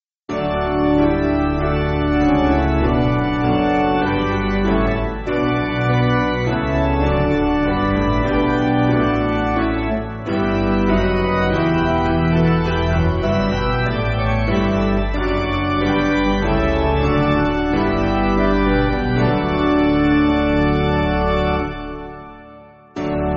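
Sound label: Piano, Music Backing Files for Churches; Father Who On Man; Charing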